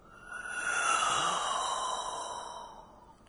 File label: breath hollow deep; doom3